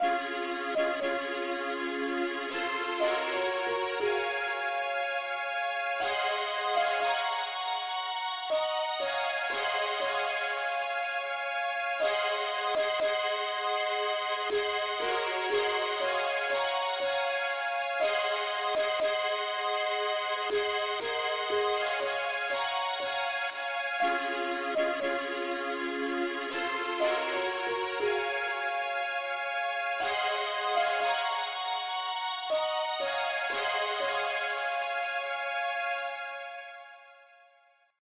mandolin Lead, key des

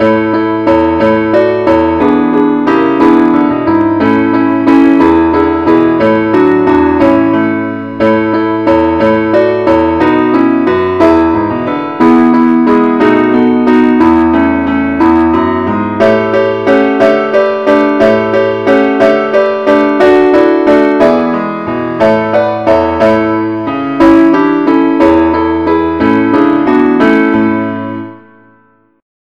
keyboard only key a

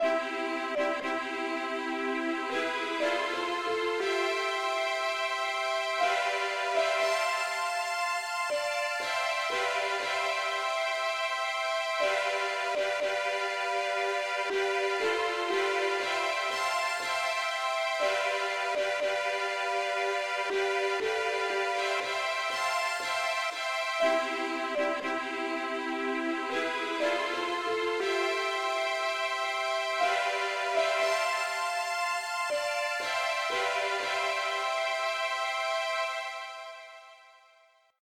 mandolin Lead, key c

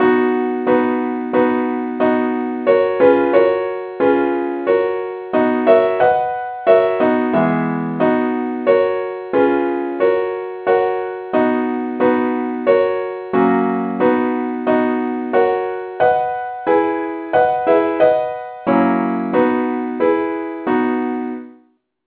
saint thomas simple acc key b

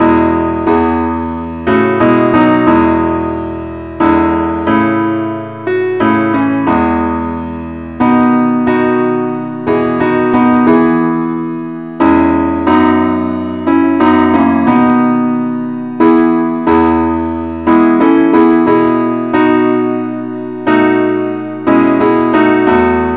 parts only key E

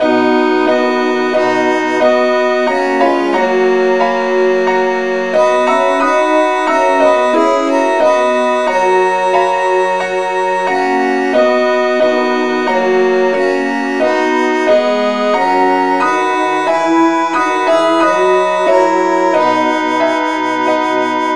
satb key b

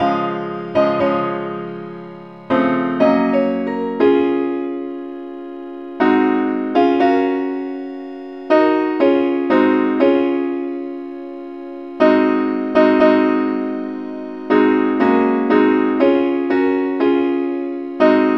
simple acc key cis

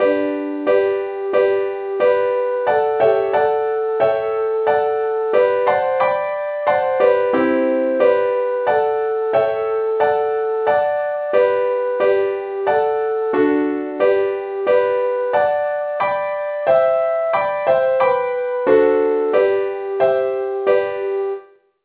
leadsheet; SAINTTHOMAS

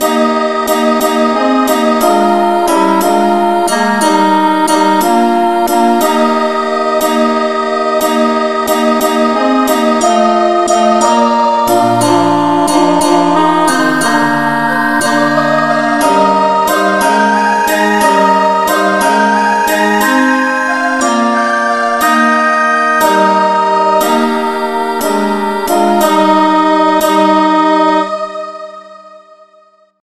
angelvoices no vocal key ees

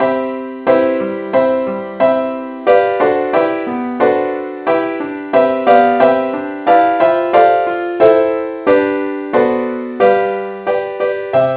keyboard key c; saintthomas

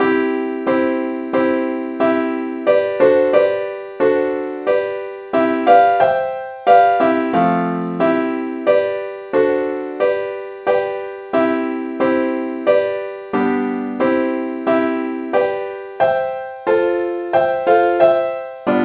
saint thomas simple acc key c

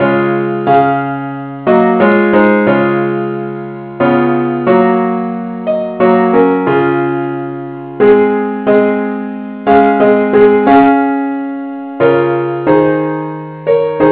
parts only key cis; i need thee

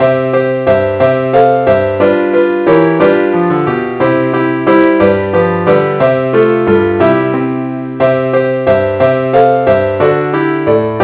keyboard only key C

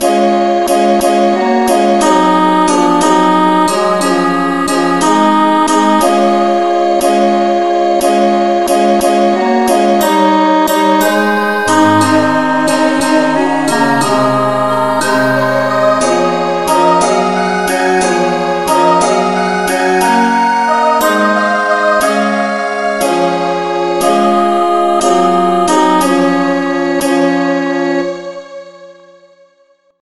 angelvoices no vocal key cis

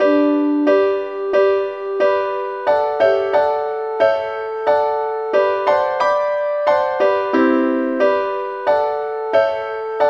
lead sheet; SAINTTHOMAS

